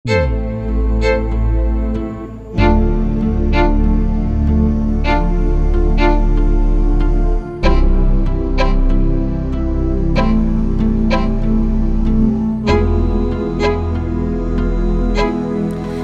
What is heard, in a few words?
drumless, no click; shadow